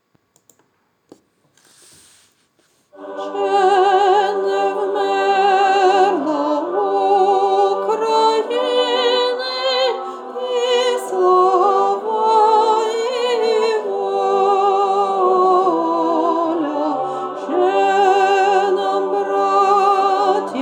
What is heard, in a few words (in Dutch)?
hymne oekraine bass